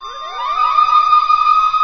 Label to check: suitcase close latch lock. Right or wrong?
Wrong